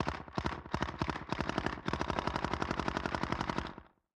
ambient shooting; STALKER

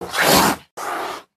hit; STALKER; customnpcs

